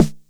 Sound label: Bass Snare, Jomox Airbase99